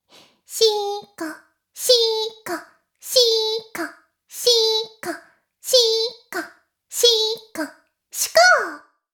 妹 しこしこ音声; おにいちゃんアイスぅー！

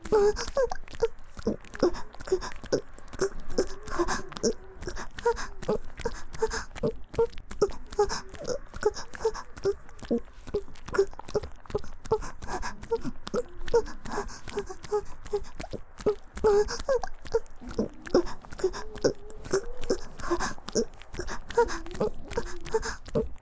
ギシアンループ, 木枯らし, 宮崎弁; 木枯らしバージョン; 田舎の古宿ン娘の夜伽

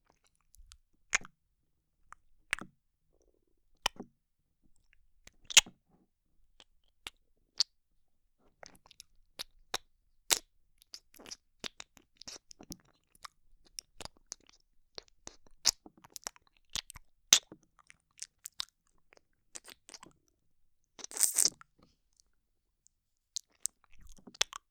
優しくお耳を吸ったりキスしたり, ASMR

